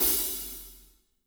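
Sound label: closed hi hat, verby kit; Spectrasonics; Burning Grooves CD2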